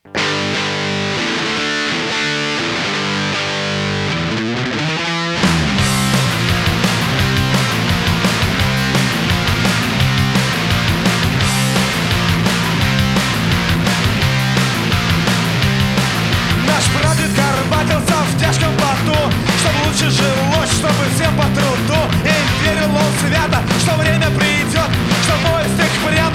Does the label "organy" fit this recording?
Yes